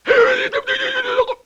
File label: LoFi Gurbles; Vintage Cartoon; Comedy Vocals